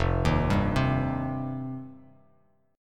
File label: F 7th Suspended 2nd, Bass Standard; F7sus2; arpeggiated; JGuitar